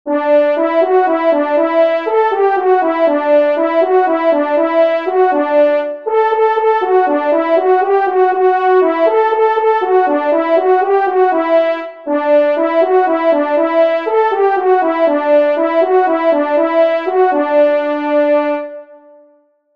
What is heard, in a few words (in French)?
Chevreuil Solo, trompes